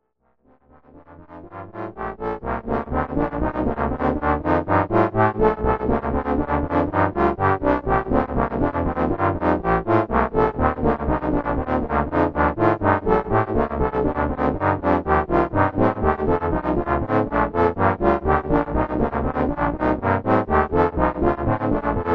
big brass

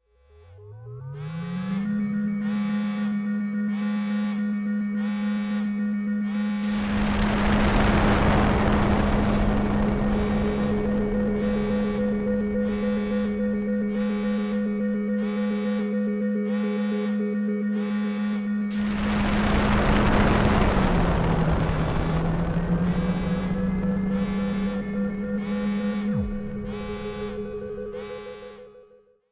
hull breach

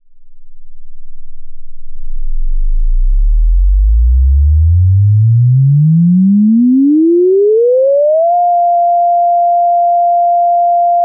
sine

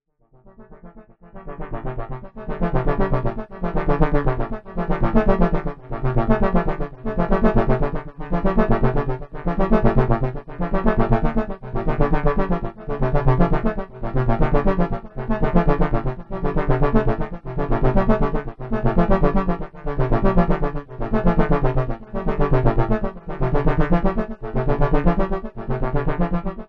brass mello

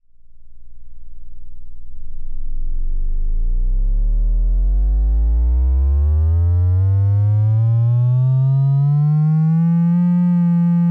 tri demo

